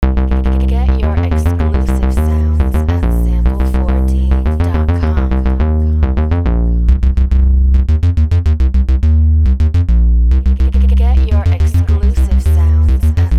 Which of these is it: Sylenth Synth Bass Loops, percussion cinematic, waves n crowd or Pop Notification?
Sylenth Synth Bass Loops